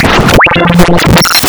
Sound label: wavetables; waveedit256